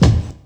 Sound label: SP1200 KIC, Kix Snares; Hip Hop; E-MU Formula 4000 Series Vol. 1; Hip Hop Nation